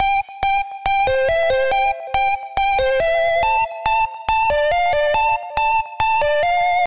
8-Bit Notification

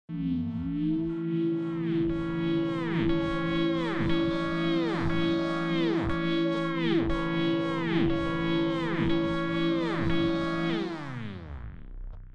Msg Tone

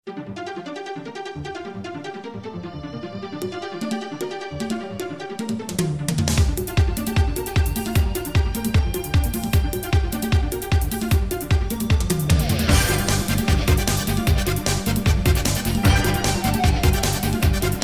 Remix; Star Fox

